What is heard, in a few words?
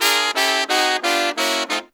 Pop & Funk Brass